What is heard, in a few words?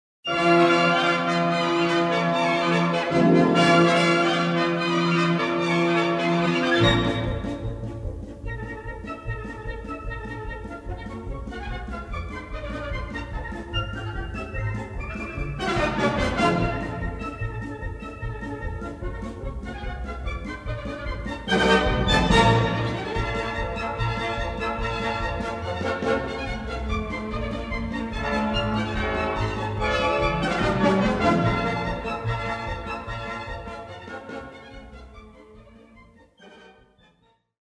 polka; r farnons